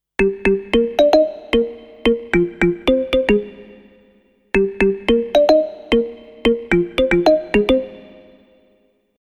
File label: Korg i3 Synth